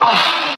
uh relief; ooh ahh

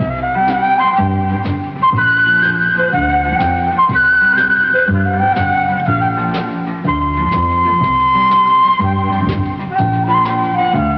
flute, Jazzmatazz loops

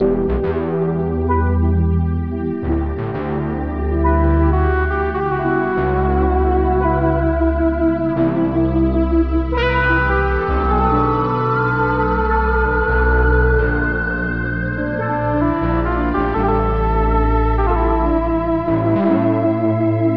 vapor waves of funk, Electronic Loops

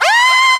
scream

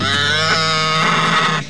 goat; Animals

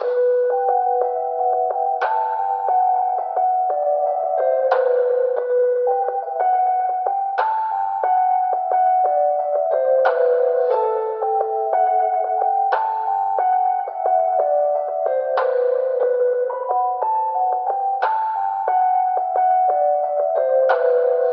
keys intriguing

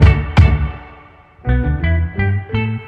horns guitar, Phonky loops

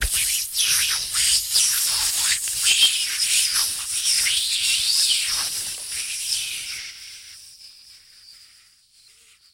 air whistle

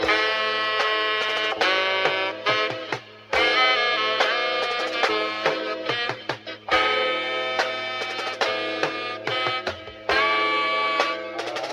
horns

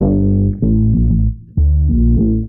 bass solo; Phonky

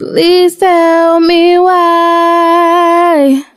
SUNG

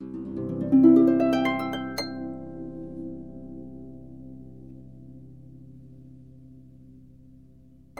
harp up; Notes

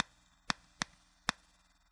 tapping, drum loops

